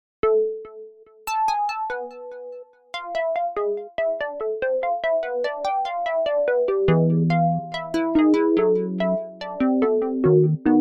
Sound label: prelude a minor; brahms